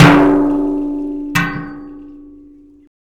hard double hit, rust kit; dry; Nucleus Soundlab; Ambient Drums

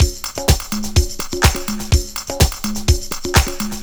DEEPHOUSE BEATS 125

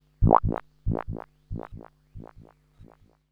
synth tekno 140 A; Techno2; Keys

